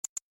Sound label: Subtle Text